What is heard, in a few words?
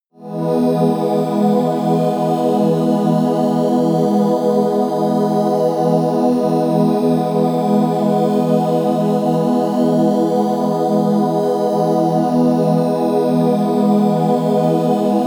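angel air, pads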